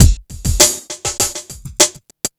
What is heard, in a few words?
drloop, drumloops, Vinyl Attack; Masterbits